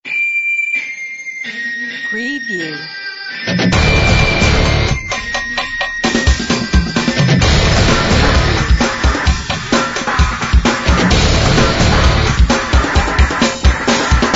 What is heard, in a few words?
background music